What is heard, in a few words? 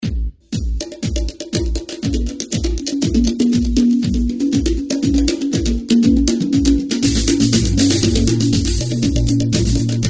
Earth Beats Dance Rhythms